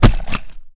IED Drop; zps; Weapons; Explosives

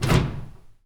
locker close; zps

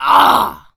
pain, Zombies; zps; Z Waitress

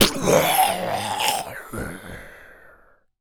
Death, Zombies; zps; Carrier

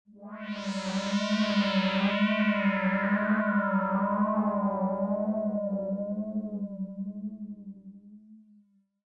shredding synthhit; zps; Ambient

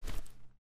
PPK Draw Empty; zps; Weapons; Firearms

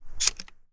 Remington 870 Reload; zps; Weapons; Firearms

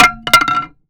Bat Collision; zps; Foley

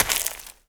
Leaf, Footsteps; zps; Foley

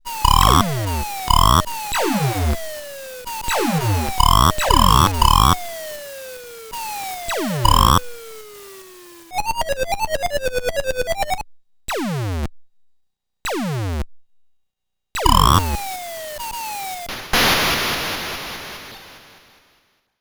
Random Arcade, Ambient; zps

